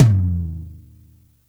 Tom; Roland R-70

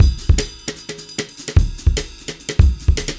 Side Stick Lo, Drums & Percussion, Electronic; Sonic Foundry (Sony Creative Software) - Electronic Point Blank

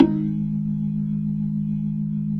B-3, Jazz; Roland LCDP10 Keys of the 60s and 70s 2

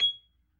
G#5 PERC, B3

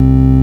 VOX, VOX ORGANS; Greytsounds VOL-1

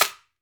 AMAZON SHAKER, Latin Shakers

